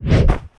ATTACK ENGINEER DASH

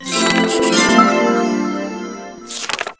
quest end

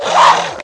ATTACK

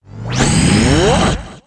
ATTACK

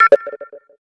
ATTENTION WINDOW

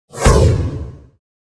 JUMP ATTACK

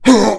BEATTACK

